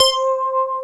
SYN, Analog; Sound & Vision; Gigapack I CD 2

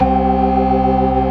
STOPS, Synth and Organ

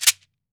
MARACA; AKAI S-Series CD-ROM Sound Library VOL-5; SHAKER.MARAC

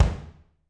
BD, Drums; Bob Clearmountain Drums I

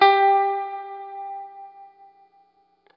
Guitar & Bass; Quantum Leap; 90's Sample CDs

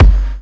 Kik; Rawcutz - Nu Forms

